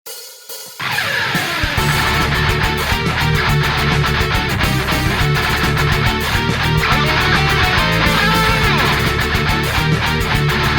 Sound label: Rock Cover; Paper Mario - Sticker Star